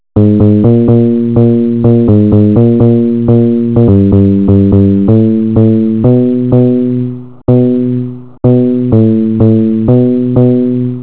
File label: tune